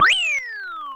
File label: Goal; New Super Mario Bros. Wii